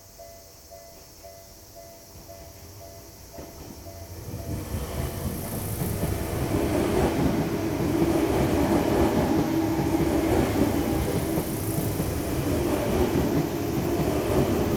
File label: train raw